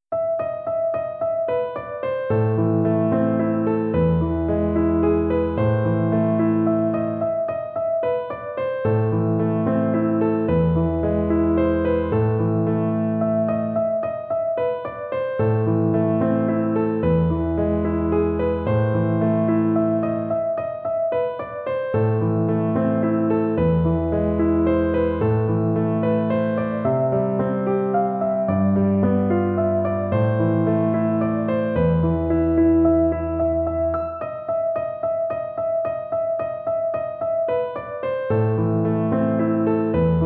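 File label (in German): Klavierkurs Für Elise; Beethoven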